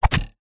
warfare spear land soil vibrate; zapsplat

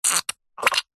foley liquid gel suck in then weak squelch

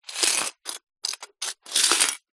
foley money coins in metal money box movement; zapsplat

